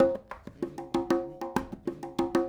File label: Congas, 70s Analog Funk; Sonic Foundry (Sony Creative Software); What it Is!